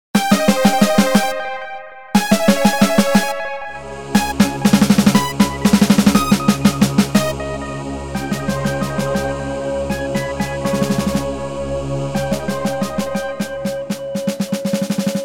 etude snare drum synth 120 bpm

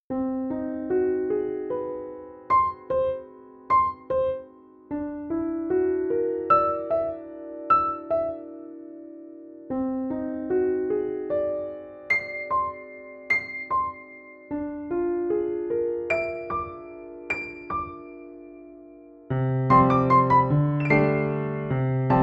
fine rain piano; destik

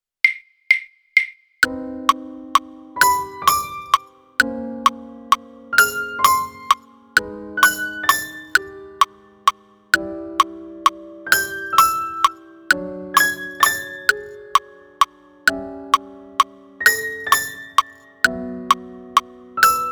piano tambourin click

